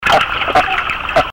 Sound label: Bark of the Searobin; soniferous